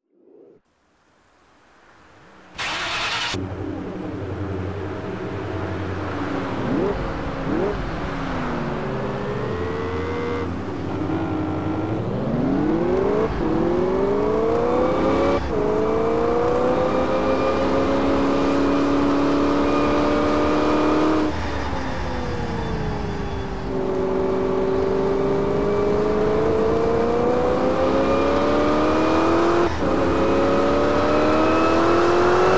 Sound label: ford gt